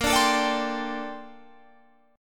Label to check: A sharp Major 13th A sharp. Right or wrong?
Right